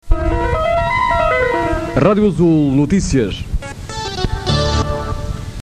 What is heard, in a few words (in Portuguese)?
Jingle Noticias